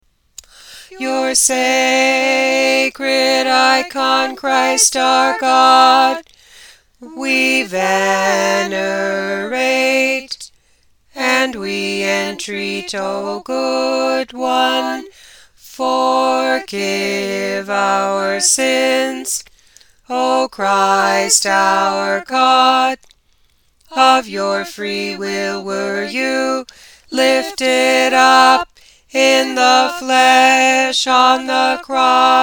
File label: tenor bass emphasis